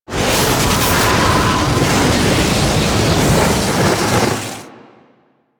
Melee Jump Slam; Weapons; Whooshes; Warframe

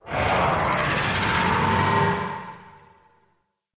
Idle Noble Power; Warframe; Warframes; Decree

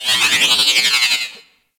Robot Metallic Textures, Ambience; Venus; Objective Rooms

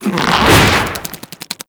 Infested Devourer Movement Whoosh E; Warframe

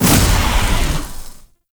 Orb Destroy; Warframe; Zariman; Survival

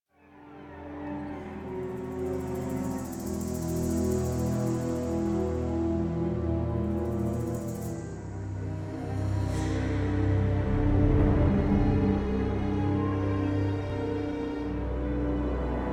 Cinematic, Music; TennoCon2022; Teshin; Warframe

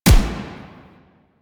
Assault Rifle Fire Initial; Weapons; Warframe; Grineer

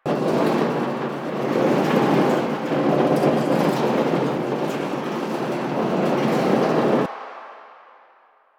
Cave Spinning Metal Tunnel Large Spinner Loop; Ambience; Warframe; Stalker Cave

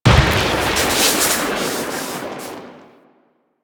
Lightning Machine Strike; Warframe; Ambience; Entrati

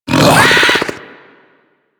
Infested Ancient Grapple Vocal; Warframe

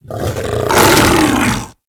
Feral Vocal Finisher Neck Shake Snap; Enemies; Kubrow; Grineer; Warframe

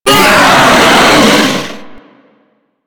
Grineer Hammer Boss Angry, Vocals; Warframe

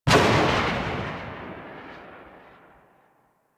Tail Generic Exterior, Weapons

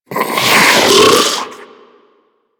Infested Diseased Ancient Breath; Warframe

